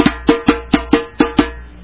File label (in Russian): barabannaya melodiya marsh